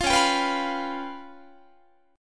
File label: Db 7th Flat 5th Db, down, Guitar; 20,21,22,21,21,22; Guitar-4A,4E,5A,5D,5Gsharp,6Csharp; Db7b5 strummed